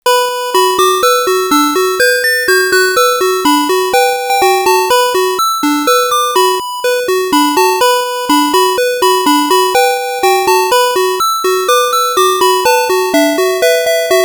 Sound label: Chord B